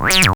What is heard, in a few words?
TECHNO; X-Static Goldmine 1